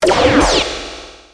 ECHEC; MOBICLIC168

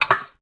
Gourde; Revista CD Expert 50

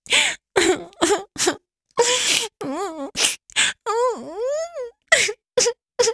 Pleure

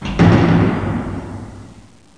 CHUTE

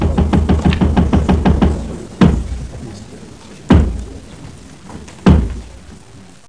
3 coups

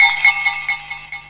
bulle

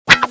clic; MOBICLIC148

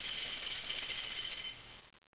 ouverture; Arc En Ciel Et Le Lagon Merveilleux; French Version

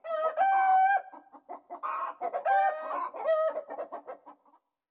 poule; El Rey Arturo

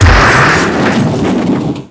BOUM; Mobiclic46

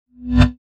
MICRO; MOBICLIC161

